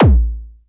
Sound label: KICK, DRUMS; Music Store Sound Service; Einstein